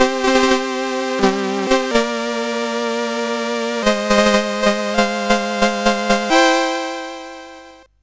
Paddle Mania, CSG8580R5

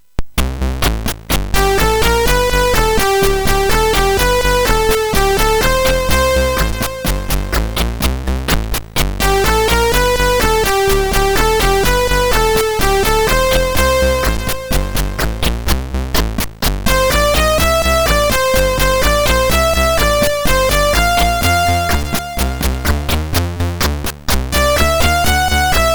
Musicbox, sid MOS6581R4

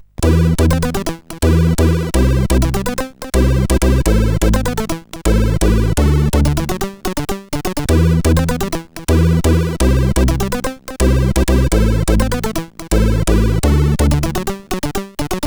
CSG 8580 R5

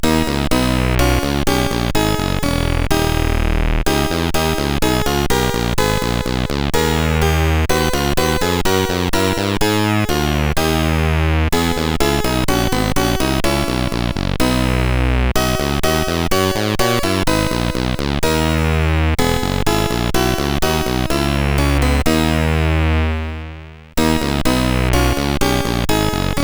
Bach CSG8580R5

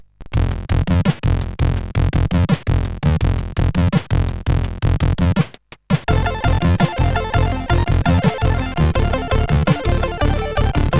Losing Light, CSG8580R5

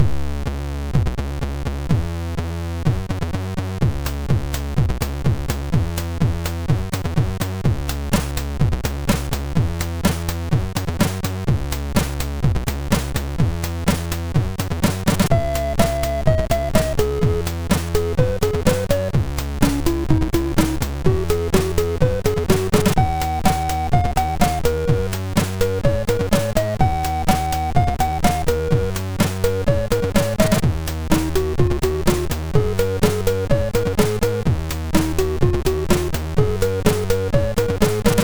sid MOS6581R2; Aftermath; hvsc

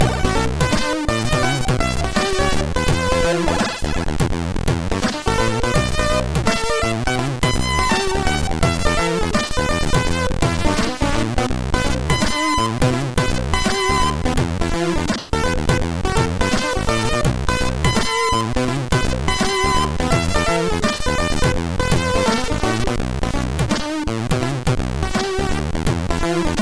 A Slower Europop Thing, sid MOS6581R4; hvsc

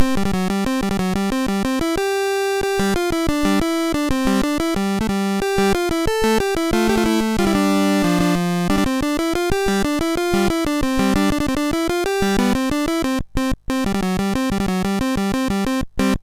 Dragons Den, MOS6581R3

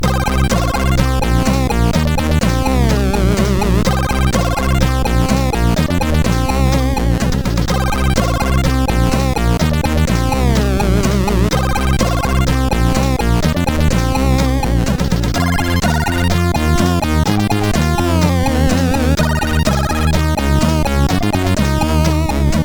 MOS6581R4; hvsc

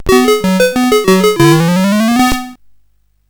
sid CSG8580R5; Donkey Kong

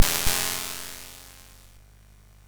sid MOS6581R4; Killer Games

